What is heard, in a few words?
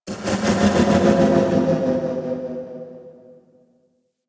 Distant Metal Textures, Asteroid; Ambience; Warframe; Grineer Asteroid